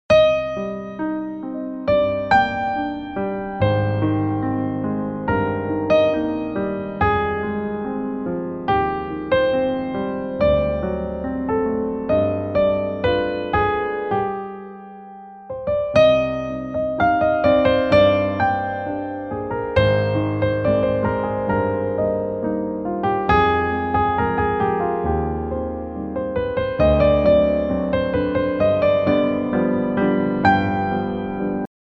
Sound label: piano